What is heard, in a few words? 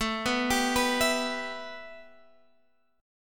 A 7th Suspended 2nd A Guitar Standard, chord; A7sus2 arpeggiated